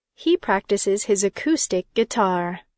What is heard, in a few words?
guitar; He practices his acoustic guitar.